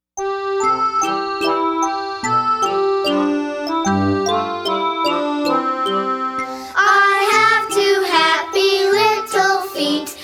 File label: words and music